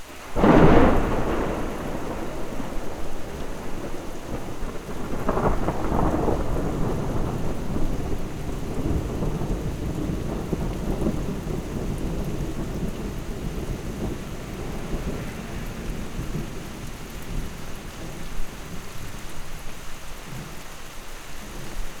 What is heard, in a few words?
thunder; tascam